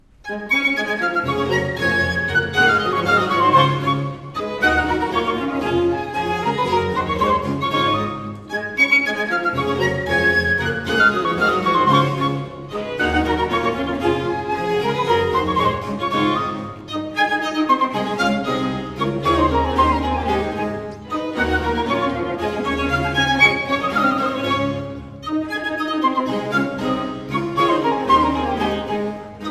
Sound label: Ragaudons 1 2; Suite in D from Les Fetes D'Hebe; Rameau